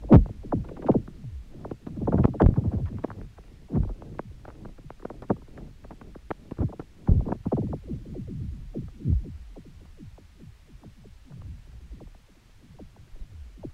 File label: sun reflection lake oscillations